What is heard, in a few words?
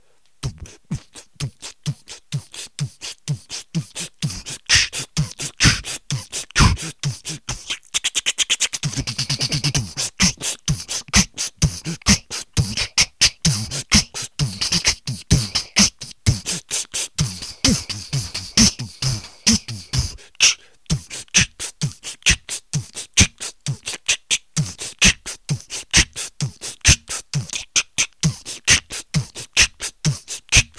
Beatbox